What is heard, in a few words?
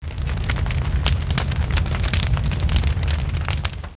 burn